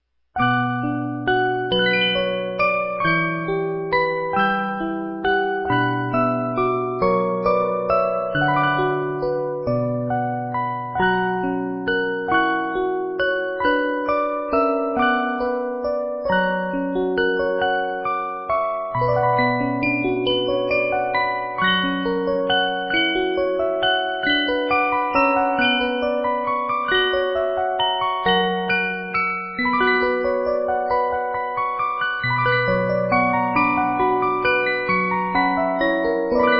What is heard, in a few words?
50-Note Movements, music box